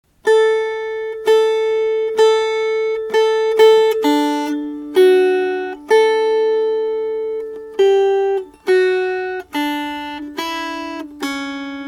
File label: Melody; Johnsons Motor Car